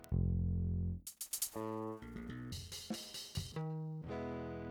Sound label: Saturator; Virtual Analog